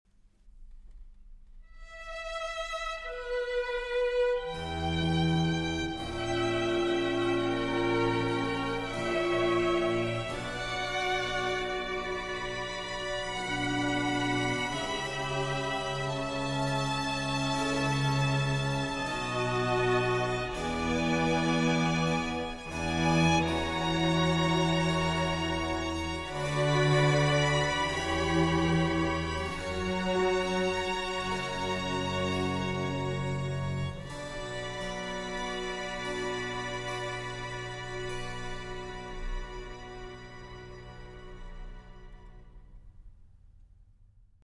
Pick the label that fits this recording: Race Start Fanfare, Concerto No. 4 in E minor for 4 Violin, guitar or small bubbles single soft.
Concerto No. 4 in E minor for 4 Violin